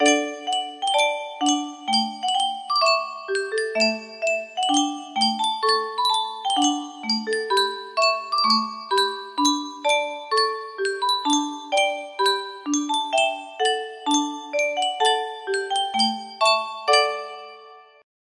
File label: Spieluhr, music box melody